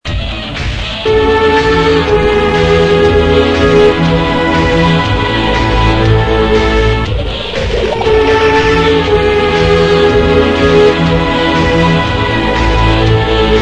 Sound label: song